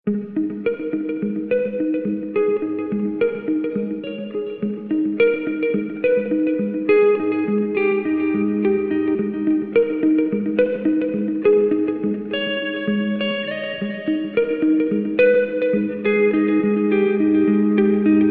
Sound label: relaxing guitar loop